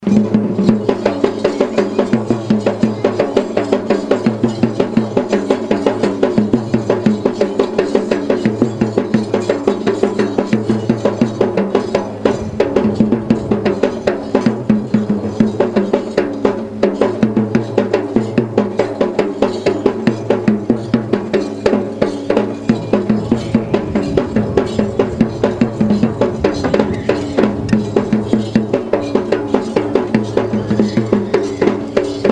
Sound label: india drums